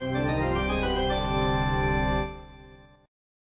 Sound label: elite task complete; Morytania